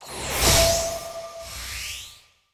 Phase Enemy Turn

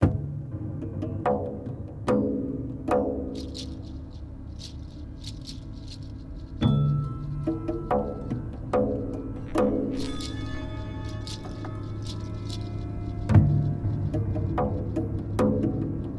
Amb Outdoor; The Hobbit; PS2

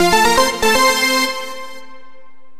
Inning Change; Entaz Baseball 2011; Android

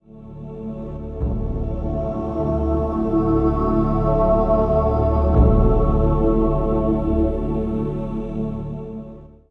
Music Hilltop Spotting

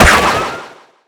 Hat KO

